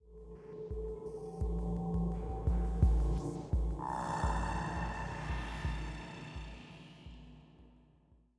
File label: Military High 85bpm